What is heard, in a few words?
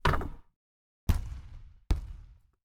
basket shoot